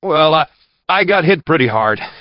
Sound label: wound